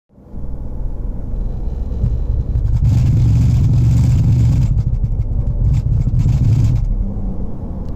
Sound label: BMW Vibrations; x5; boite transfert